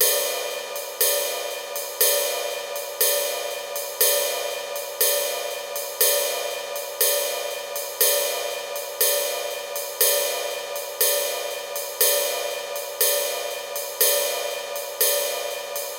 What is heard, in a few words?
Rides 120BPM; Ghosthack; Kit 11 - Natural Selection; Percussion Loops